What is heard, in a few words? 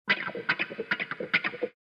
Fast Wah Funk Guitar